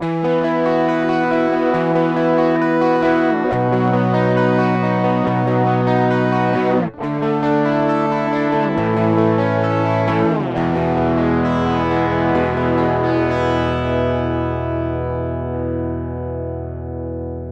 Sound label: R66 bridge Lo Overdrive